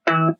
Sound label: bass note double speed; doctor who